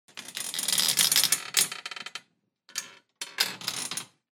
Creak Metal Two Person Folding Camp Cot Sitting Movement Stress